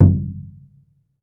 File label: TOLOWA, American Natives, Earth Tones